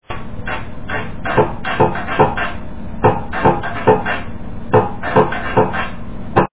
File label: dikanza; Etnologia